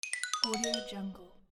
xylophone fall down

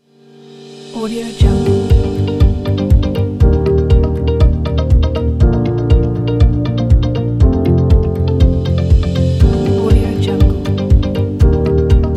corporate success

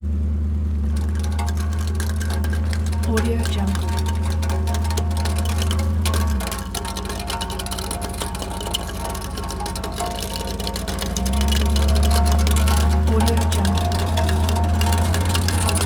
muffler drag

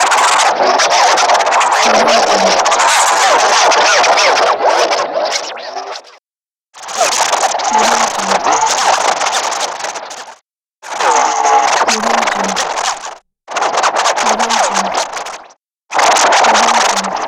scratch

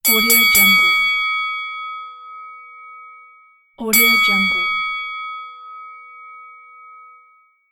boxing bell

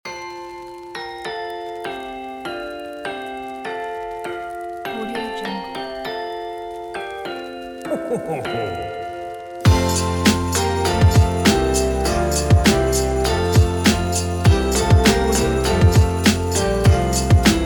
christmas lofi hip hop

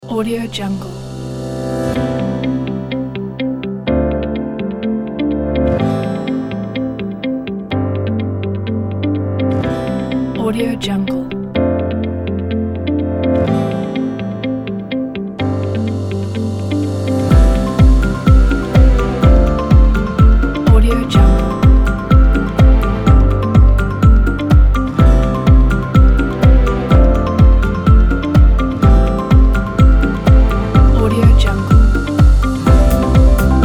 motivational inspirational corporate feelings

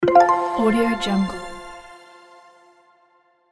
game show right answer sparkle

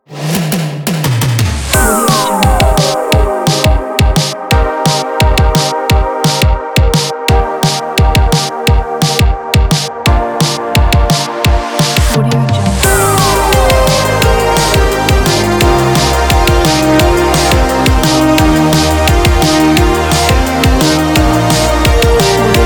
disco

